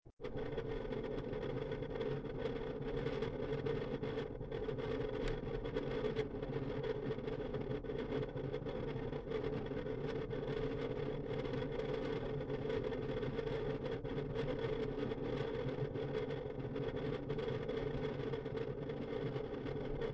WD Red Pro 20TB Noise in a Synology DS920 NAS HEAVY ACCESS